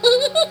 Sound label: gigle